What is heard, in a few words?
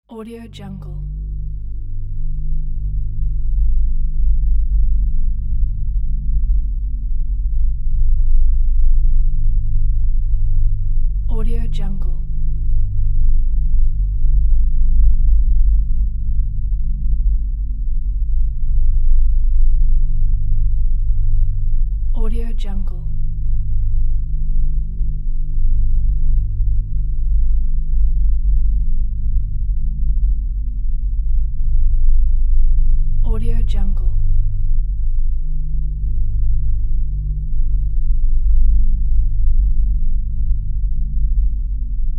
low rumble